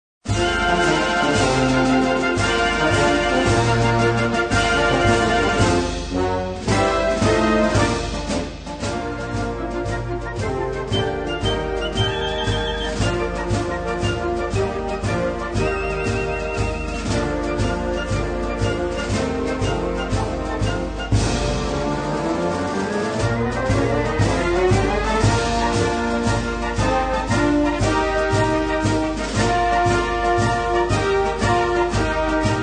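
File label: grandioznyi marsh, grand march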